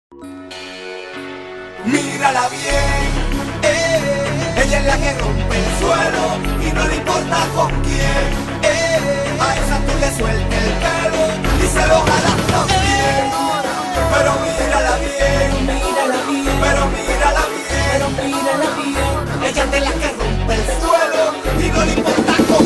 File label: SOLO REGGAETON; ADULT